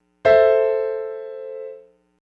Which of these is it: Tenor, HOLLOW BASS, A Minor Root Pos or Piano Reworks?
A Minor Root Pos